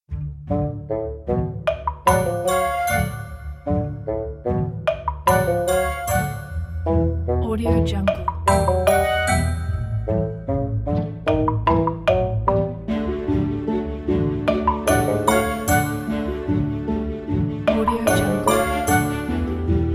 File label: sneaky steps